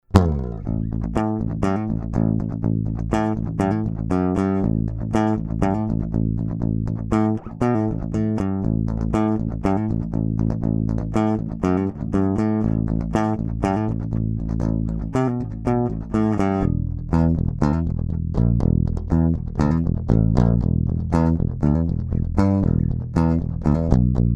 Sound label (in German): Squier P Bass Plektrum